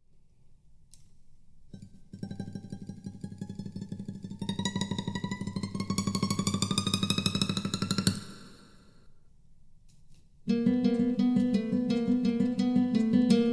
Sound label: Flamenco; mosquito